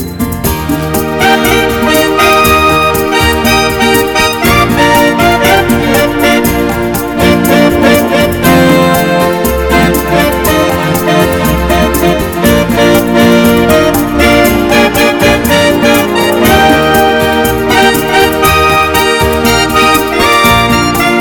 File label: Great Orchestra Sounds